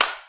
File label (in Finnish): laimaytys, Clangy Crunchy Foundsound Kit